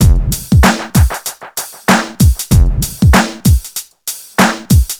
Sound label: 96 bpm breakbeat f key